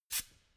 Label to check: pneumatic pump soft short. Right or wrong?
Right